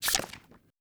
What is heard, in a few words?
Btn Close First